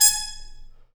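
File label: brass trumpet mute stab, Harmon stabs; Harmon Amb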